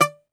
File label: Greek Bazouki, Plucked Strings